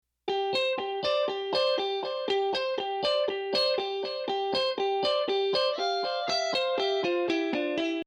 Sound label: Guitar 8